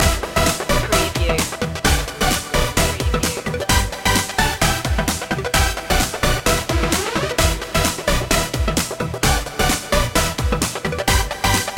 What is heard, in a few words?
oriental dance loop